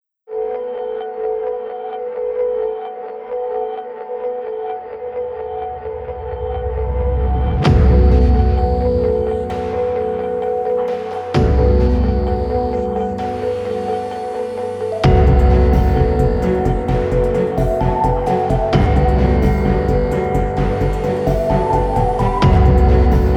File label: Exhalation, NEWS BEDS